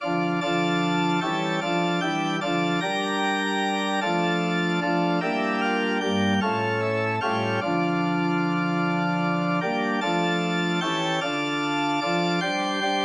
ORG, organ

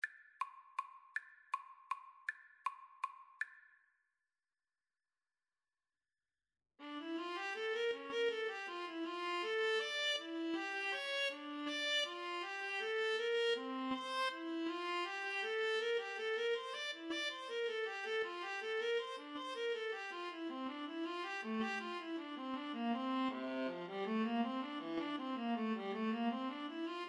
Violin Viola Duet; bach invention 4

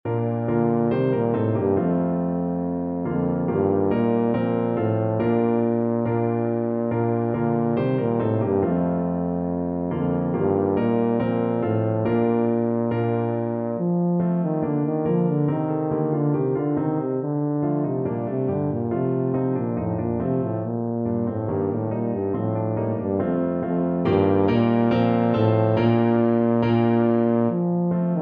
ding dong, tuba

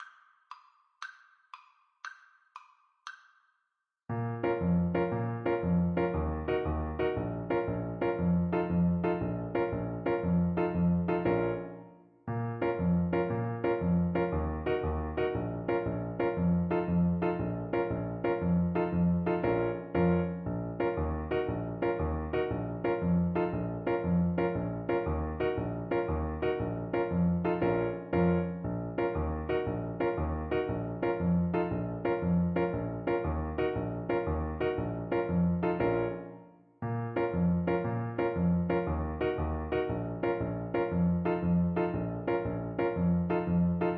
tarantella, Saxophone

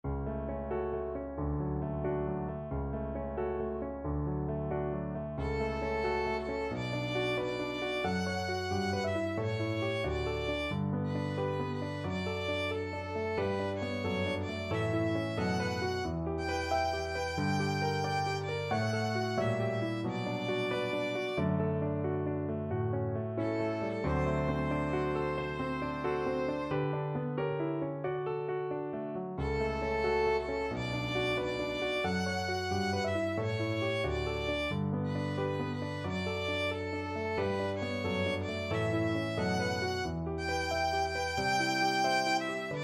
strauss op10 1 zueignung violin

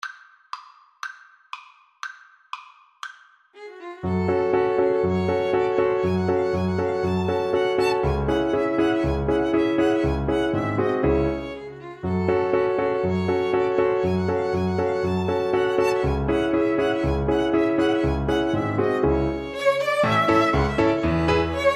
Piano Trio

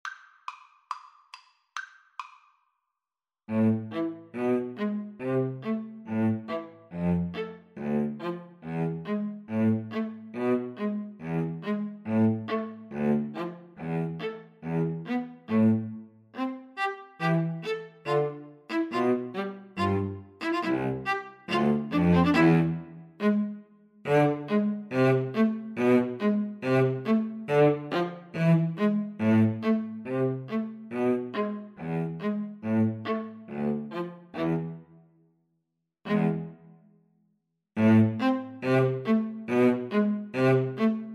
gavotte, String trio

